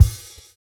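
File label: kick, Roland MV8800 drum kit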